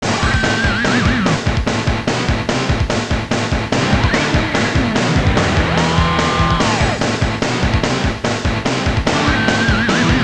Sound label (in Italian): suonerie musica rock